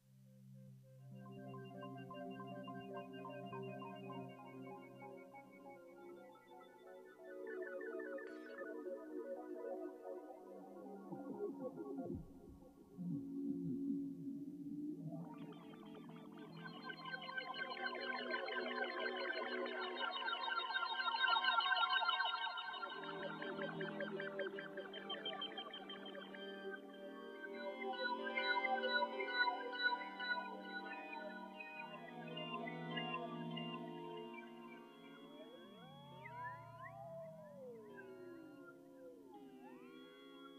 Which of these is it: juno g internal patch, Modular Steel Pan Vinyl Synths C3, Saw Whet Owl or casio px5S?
juno g internal patch